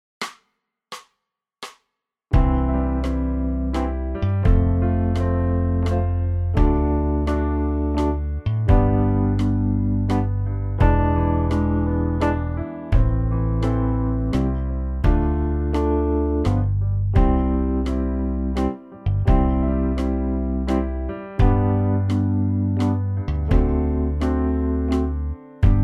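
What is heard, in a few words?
we wish acoustic; xmas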